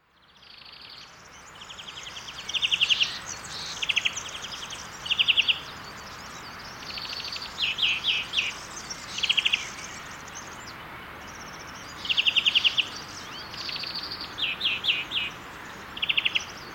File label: Frankincense Forest